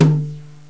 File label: Brush Tom, Drums; GMPlay; XG